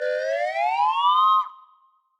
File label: whistle UP